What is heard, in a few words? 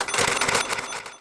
echo coins; nova sound